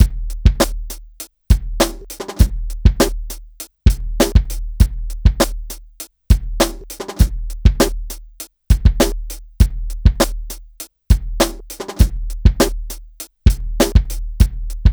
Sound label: Drum Kit, Electro Lounge; Sonic Foundry (Sony Creative Software); 100 Divide This